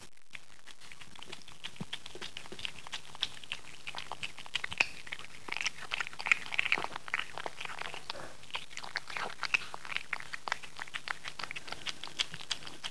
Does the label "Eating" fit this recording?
Yes